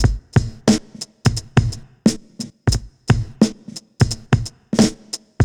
Drums; Sonic Foundry; Stylus Pressure Urban Grooves on Digital Wax; Back Forth

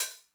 Hat Open Accent Min Decay, 909

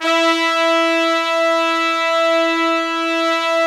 BRASS SECTN, Pop & Funk Brass; Best Service ProSamples vol.25; AKAI

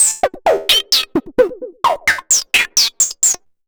Android Arps 130BPM; sci-fi; Loops

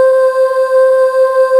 SYNVX; Greytsounds; Scott Peers Sound Engineering

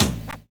analog fi; Univers Sons - Kitch 70s

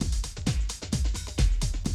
130, Classic DrumLoops

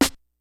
snares; Kid Nepro; Elite; KICKS SNARES

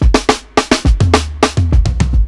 105 FILL, DRUMS LOOPS; BASEMENT DRUMS VOL 2

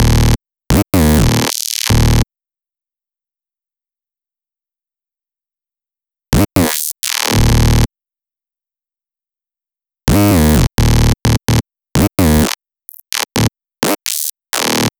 dirty bass; Session 09 - Retro Fit

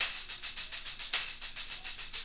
Tambourine

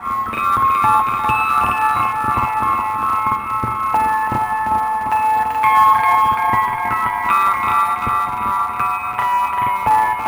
Interference Melody; Sonic Foundry (Sony Creative Software); Loop Noir

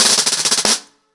Snare 90BPM, ACOUSTIC DRUMS; Sonokinetic - The Roots Drumloops

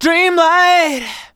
vocals sung F#; Techno Trance Essentials

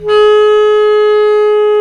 Bs Clarinet, Orchestral Winds; Roland LCDP04 Orchestral Winds; Lo Clarinets

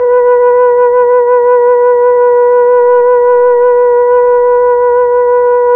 Tron Flute B4; Tron Flutes

